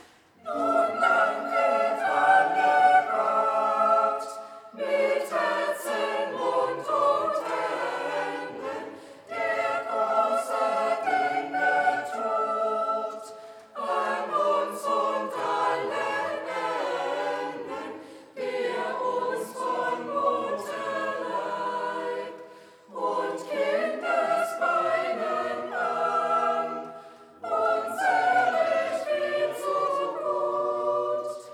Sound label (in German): Chor; Nun danket alle Gott